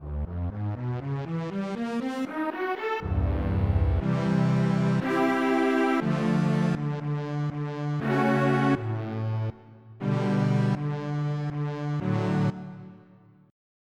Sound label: String Ensemble; FF7